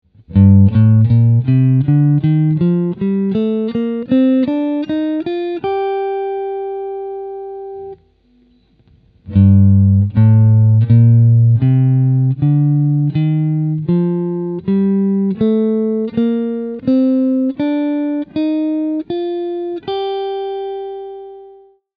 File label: Minor Scale, guitar